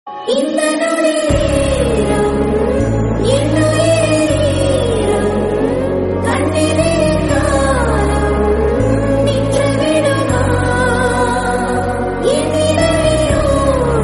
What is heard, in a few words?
Tamil Song; Varisu